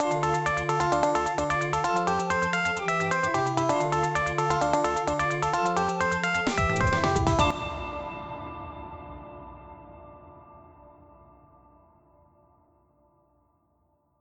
music stops; zte

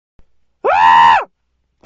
scream

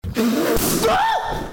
blows and pop

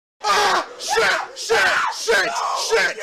screaming; ksi

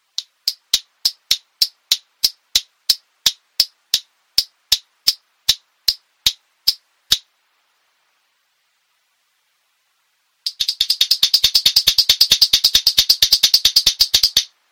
clicking prank bass boost

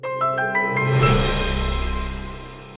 launch; roblox rivals

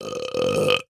burp; twdg

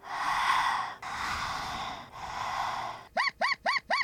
wipe glasses

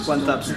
scream; 1 tap training with cs go pro

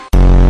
vibe boom

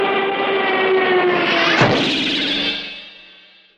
crash burn; dota2; chatwheel

